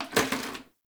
Janitor Plastic Bottle Water Drop; Warframe; Ambience; NineteenNinetyNine

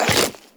Step Goo, Footsteps, Walk; Warframe; Tenno

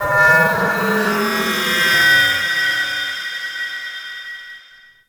Landscape Textures, Ambience; Venus Open World; Objective Rooms

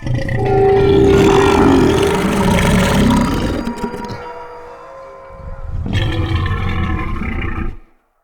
Corrupted Smog Ability Burp, Animals; Bromius

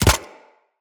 Arachnoid Micro Land; Enemies; Corpus

